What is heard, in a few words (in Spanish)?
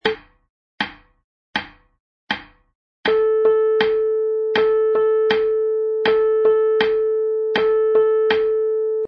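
ligadura corcheas negra, piano midi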